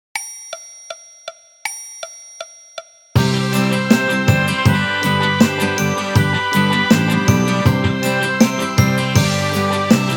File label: Orchestra Version